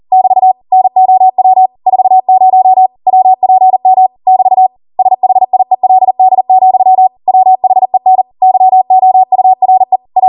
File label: 40WPM, Morse, code practice